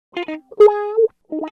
133 Dance El-Guitar Wah F; Dance DJ

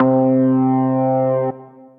Solo, synth lead; Singularity Multi Solo; Ableton; Factory Packs; Singularities

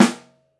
Snare Mono; Ableton Foundation FreePacks; Snare Designer

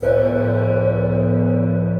Resonant Cymbal Blender, playful percussion; Ableton; Mood Reel

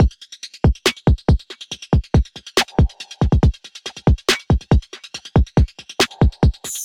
MPE Surge Kit 70 bpm, Trap Drums; Trap Drums by Sound Oracle; Ableton; Factory Packs

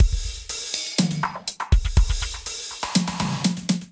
Kit groove 122 bpm; MIDI Clips; Highway Kit groove; Drive and Glow; Ableton; Factory Packs

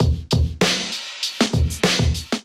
Kit 98 bpm, Drums; MIDI Clips; Ableton; Factory Packs; Chop and Swing

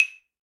Claves C; Latin Percussion; Drum Hits; Wood; Ableton; Factory Packs

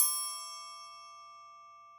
Triangle E Open, Latin Percussion; Drums; Drum Hits; Bell; Ableton; Factory Packs

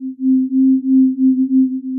Mora Pad, Synth Pad; Instruments; Ableton; Synth Essentials; Operator

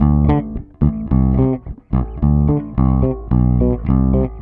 108 Old School Bass D, E-Bass; Pop Rock

